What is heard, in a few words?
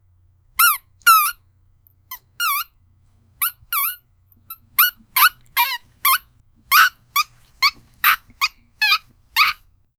light playful thumps and, playful